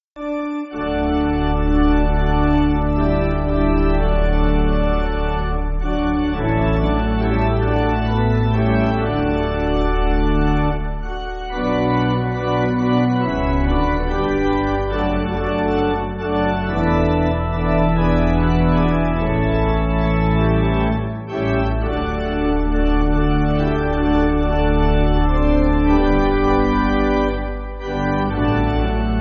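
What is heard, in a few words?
Pipe Organ, Backing Files for Churches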